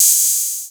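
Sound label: 808 hi-hat; Wizoo - Platinum 24 Electronic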